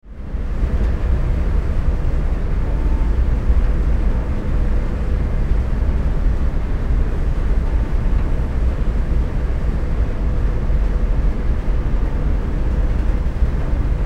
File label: Boat Interior